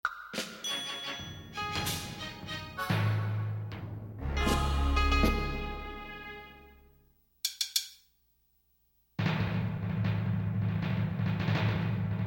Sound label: compile fanfare; Disc Station